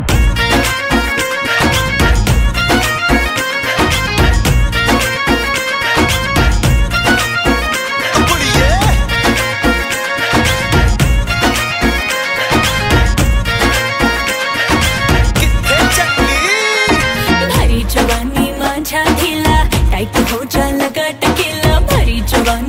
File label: ringtone bollywood ringtones